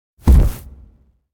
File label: Footstep From Monster Dinosaur; Hollywood Edge; sound designer's toolkit v2